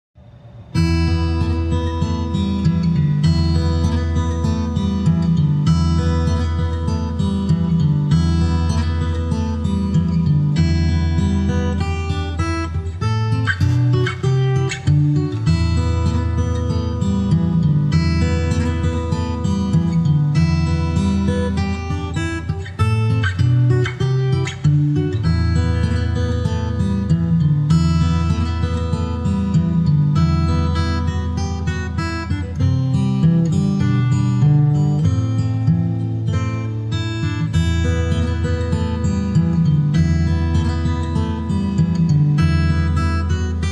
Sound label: Guitar Instrumentals